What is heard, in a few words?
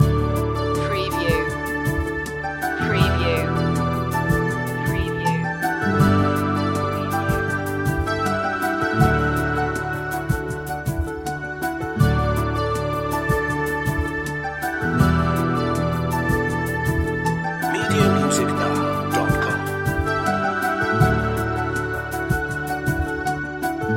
water loop